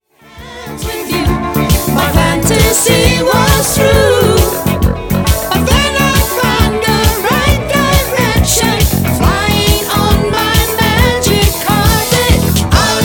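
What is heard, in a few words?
pops group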